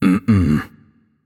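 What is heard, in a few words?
nonverbal disagree; voicepack; smite; merlin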